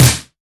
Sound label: shiny snare drum sound c key, Royality free snare drum sample tuned to the C note.